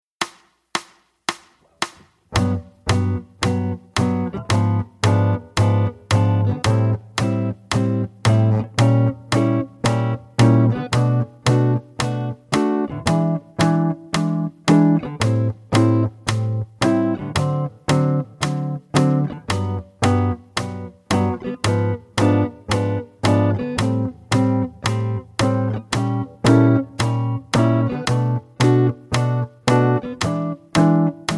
Diminished 7th Chords, jazz guitar

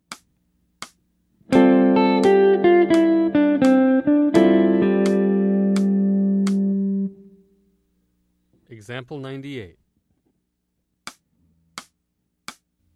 Dominant 11 Licks, jazz guitar

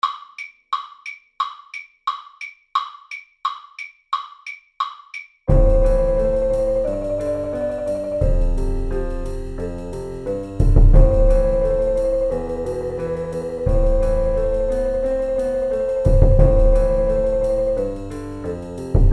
marimba; C Alan Publications

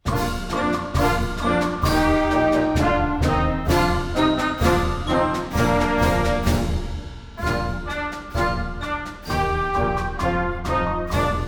galloping march; wingert jones music

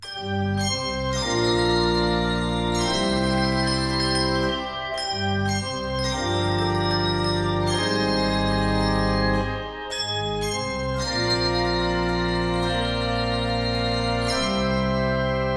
Organ and Handbell

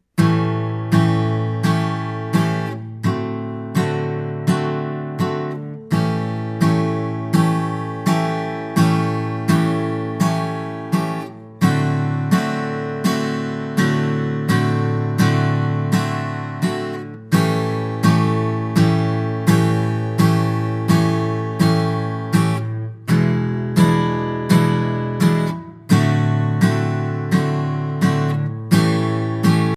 7 Chord Blues; Alfred Publishing

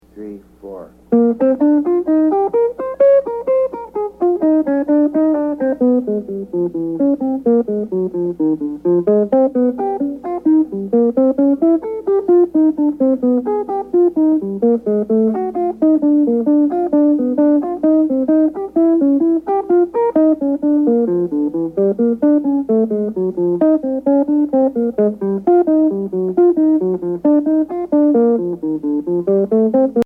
Guitar Solo

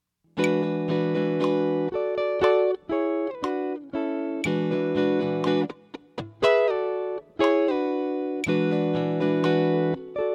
12 Bar Blues With Chord Fills, blues guitar

